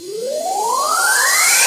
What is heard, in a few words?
Sweeps Medium